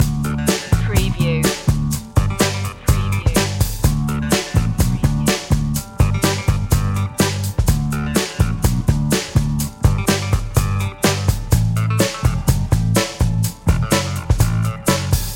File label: happy groove loop